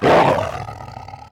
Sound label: canis bite; Hellboy